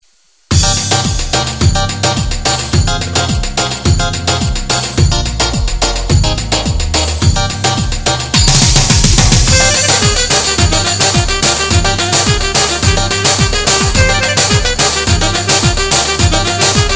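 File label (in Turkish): oyun havası, GW-8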